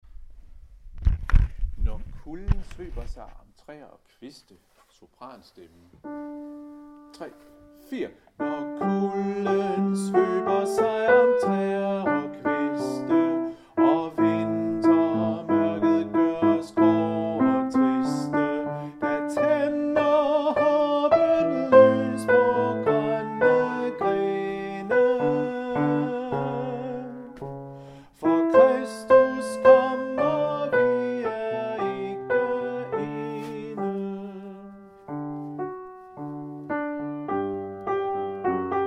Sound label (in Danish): sopran